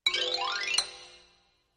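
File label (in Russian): xylophone melody long slide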